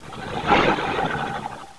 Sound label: gonome jumpattack